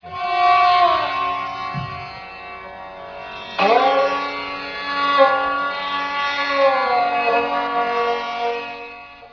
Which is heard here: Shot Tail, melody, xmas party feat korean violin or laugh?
melody